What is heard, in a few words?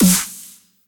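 drum beat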